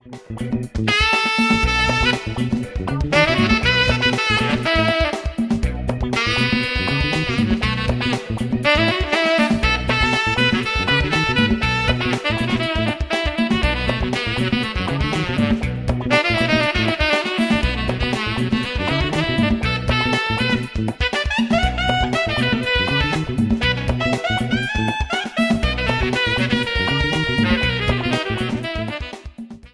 Funk; Gottsu Studio

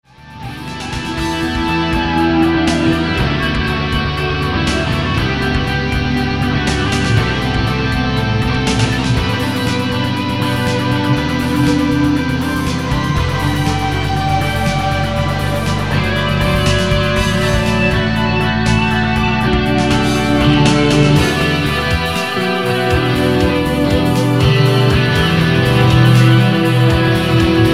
rock

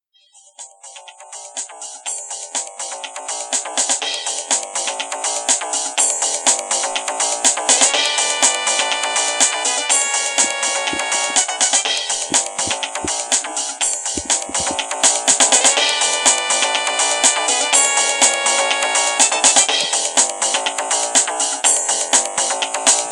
battle boss; JungleWars2

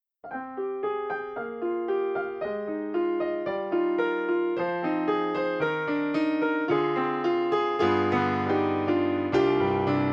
studio, piano

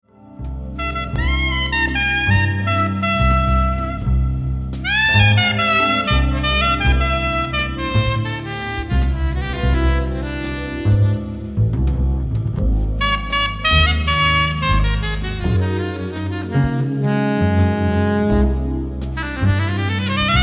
Song Sop; Saxz Emperer

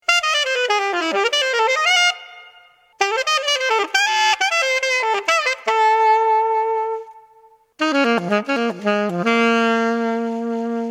Alto

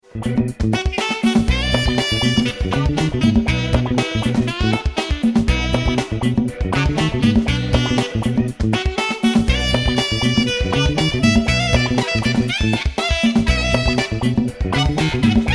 Funk Alto; Pozol M2

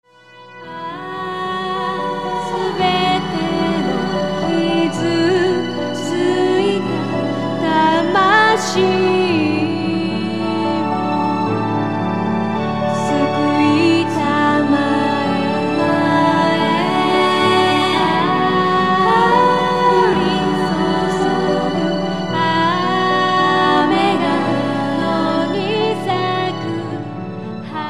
vocal

